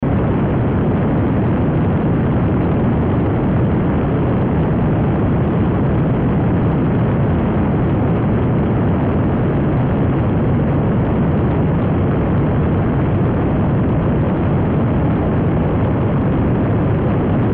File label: Sci Fi Rocket Booster Space Shuttle Rumble Crackle Loop; Spacecraft; Spacecrafts